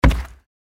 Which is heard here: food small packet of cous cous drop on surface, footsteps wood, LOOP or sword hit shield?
footsteps wood